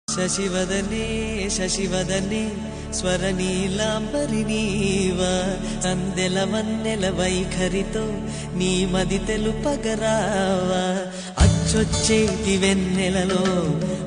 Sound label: telugu cute love song